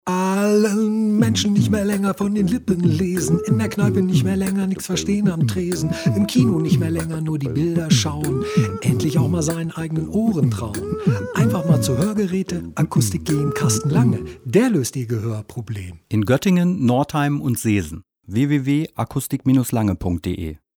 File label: Jingle